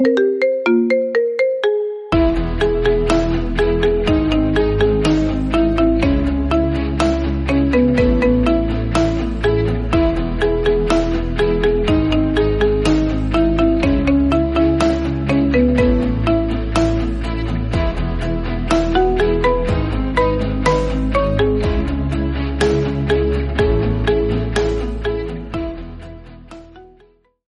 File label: samsung marimba instrumental tone